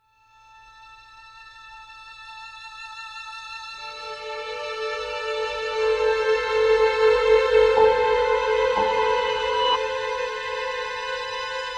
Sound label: Uncertainty Ambient